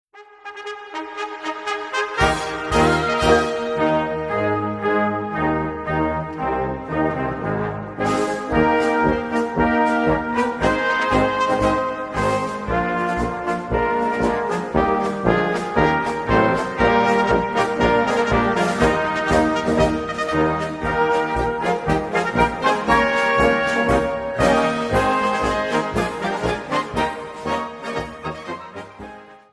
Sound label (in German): Badnerlied Klingelton instrumental